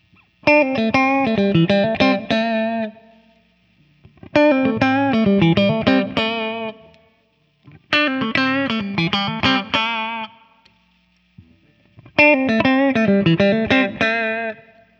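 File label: Guild X79 US Clean Riff, guitar; Skyhawk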